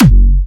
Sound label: Springer F#4, Big Room Kicks